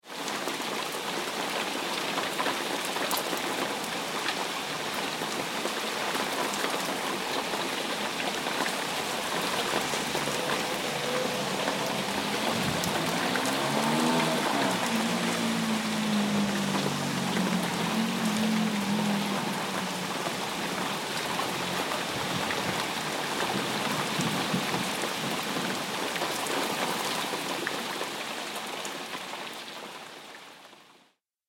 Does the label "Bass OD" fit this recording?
No